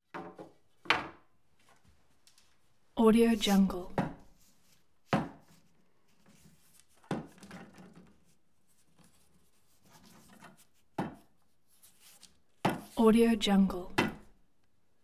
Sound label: wood paint pole roller handle